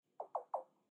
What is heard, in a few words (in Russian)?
sist zvuk sams